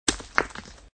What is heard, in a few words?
stone break